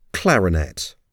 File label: clarinet